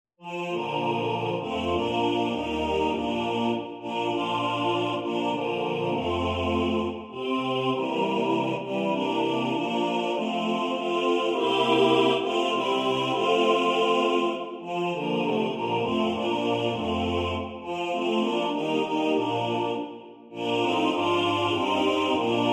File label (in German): alle Stimmen gleich gewichtet